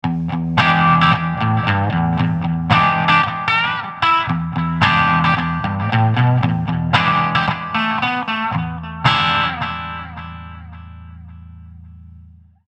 Fender Bandmaster clean

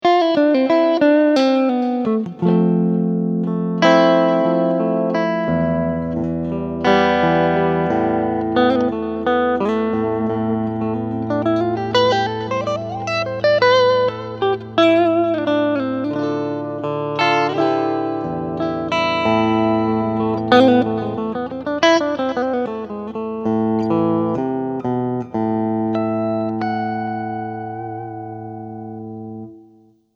Clean Picking Front Humbuck

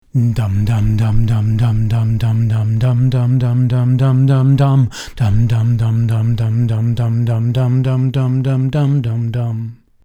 Camden cream

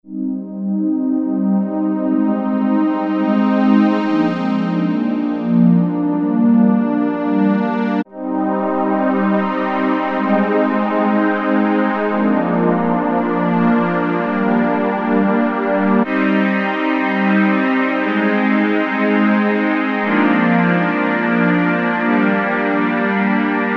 Synths

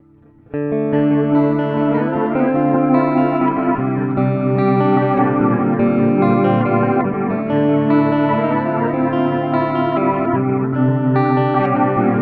loop rotary

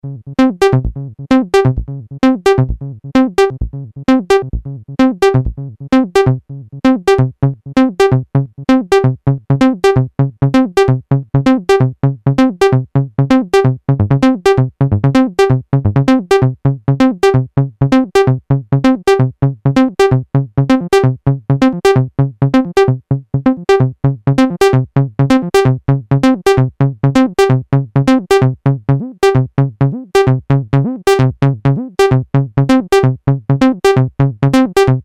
Live Accent + Glide Mod

